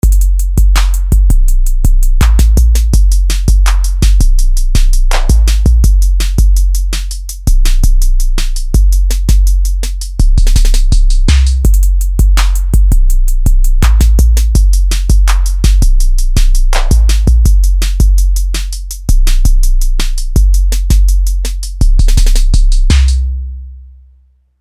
808 MPC60 16 levels

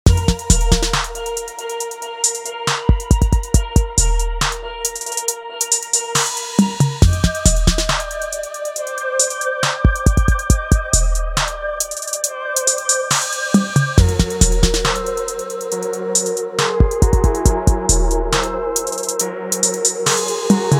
Trapmosphere, 138

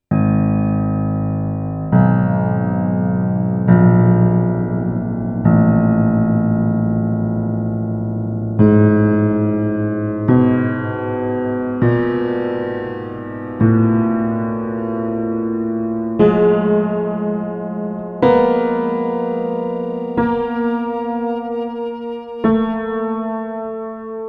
Broken Piano

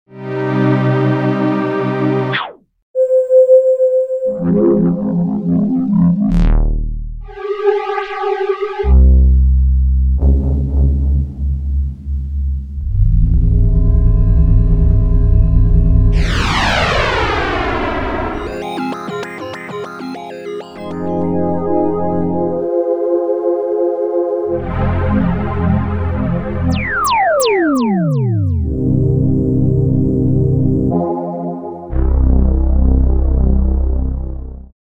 Juno 106 sounds

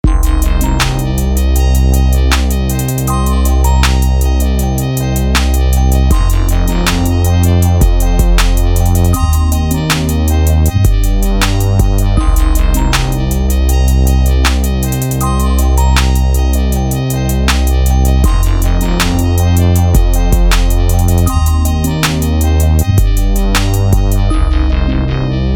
casio cz101

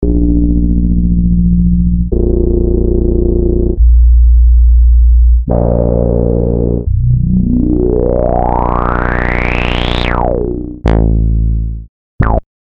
SH 101

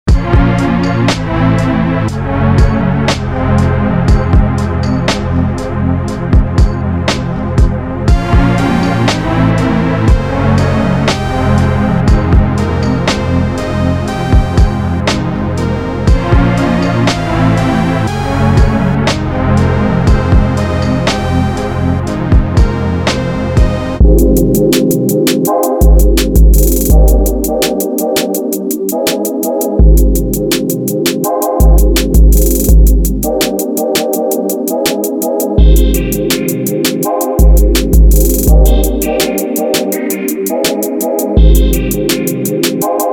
juno asr 10 beat Mash

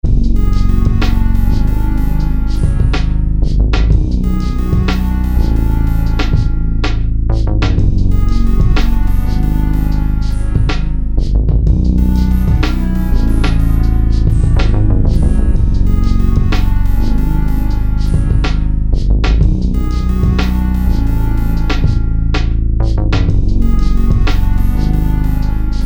SH 101 Bass Synth

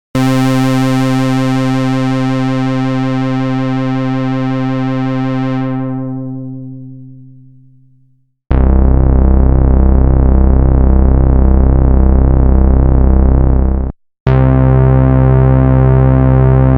Juno-106 mini

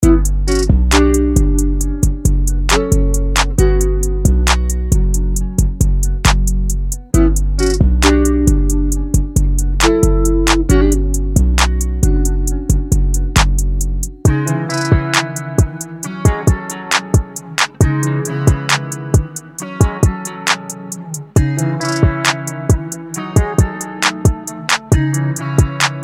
Guitar loops Elec 135

